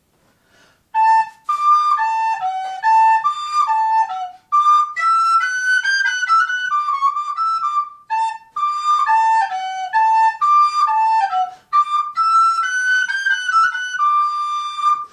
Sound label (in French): allemande, flute